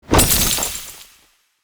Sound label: Damage; SNKRX